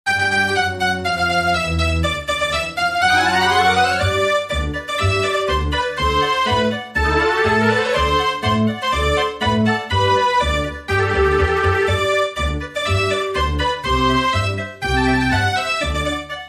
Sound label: No Percussion Drums